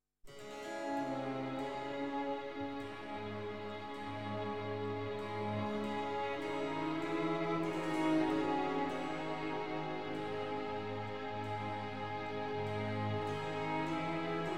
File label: Violin Concerto in E Major, Adagio; BWV 1042